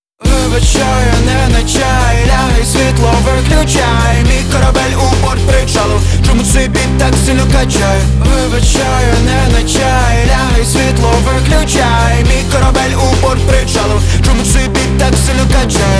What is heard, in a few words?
Rock mixed